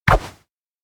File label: thumbs up; aino